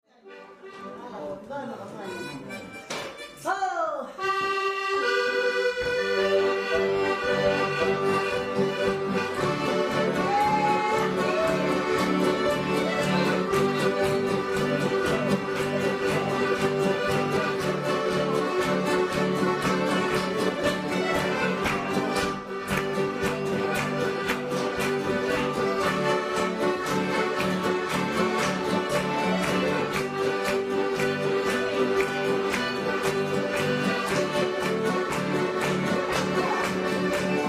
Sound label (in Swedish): polka